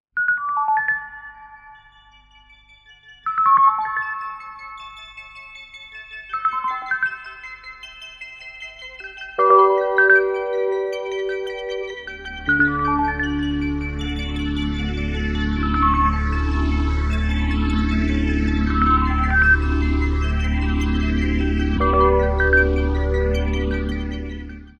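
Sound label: calm beautiful melody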